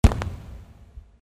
explosion; Kung Pow

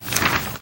book page turn

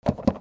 locked; byra

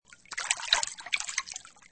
water

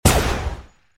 gunshot; Flashpoint Ultimate 13; thelastnight

